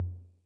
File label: Tom Low Mid Decay, 808